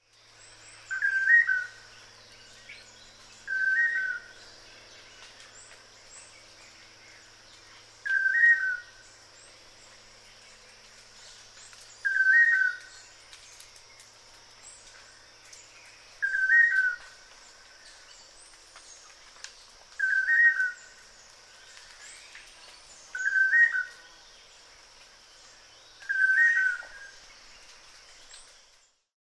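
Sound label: Manakin, bird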